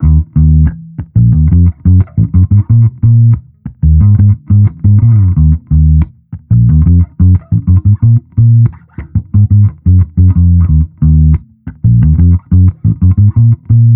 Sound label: Amped loop 90 Eb, Electric Bass Loops; Slate Digital BASSHOLE Sample Pack